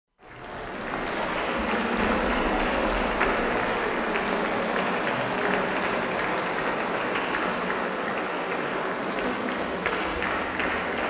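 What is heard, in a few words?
ballet artists came out on stage, applause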